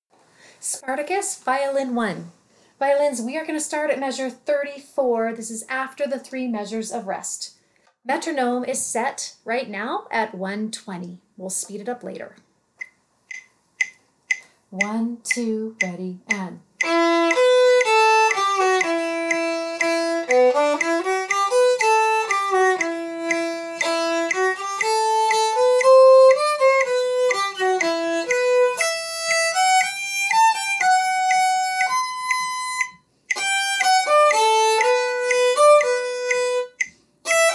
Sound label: violin; spartacus